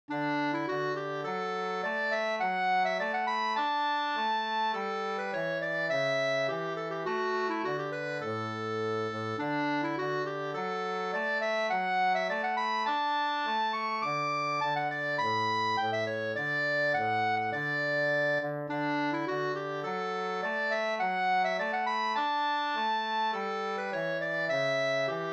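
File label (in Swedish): brudmarsch Brudlat Jämtland 2 stämmor; Anders i Logarn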